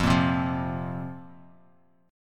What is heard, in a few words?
F Suspended 4th F, Bass Standard; Fsus4 strummed; 13,13,10,10; JGuitar